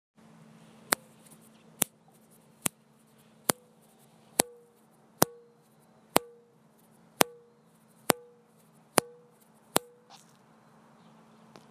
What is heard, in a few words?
Acute Acidosis Metallic Sound